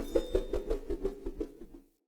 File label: Throwing Knives Projectile